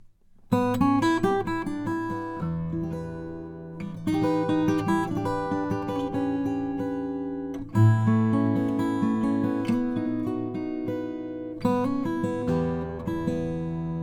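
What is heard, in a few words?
r deluxe mahogany, guitars; froggy bottom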